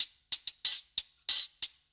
6-8 Metal Crasher, Perc Loops; Drum Tools

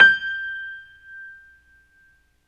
PNO Grand Pno; Club-50 - Foundations Roland

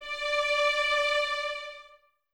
Violins Detache D 5; Miroslav Vitous; 11 Violins

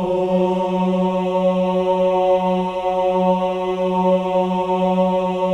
OH F#3, CHOIR OHS; Spectrasonics; Symphony of Voices

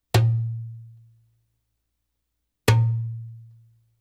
Arabic Rhythms

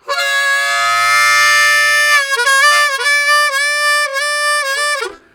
Grind, Harmonica; Zero G - Harmonica

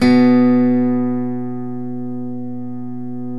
12 STRING; OMI - Universe of Sounds

